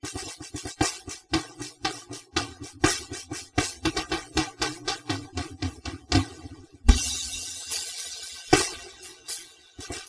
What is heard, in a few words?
Drum Solo